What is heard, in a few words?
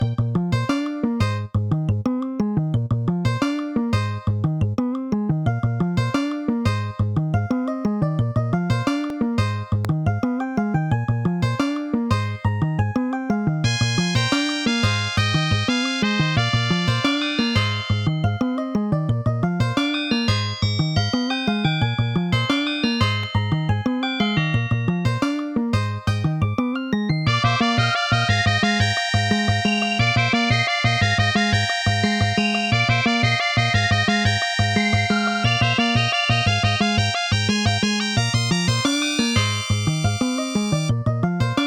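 level1, Nvidia NV1 GM mode; soundcard test; Hocus Pocus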